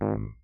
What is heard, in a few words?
G#1, Rhodes Mark II 88 key hammered; CEPCo Sample Series Vol. 3